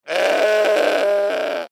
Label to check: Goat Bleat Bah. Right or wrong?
Right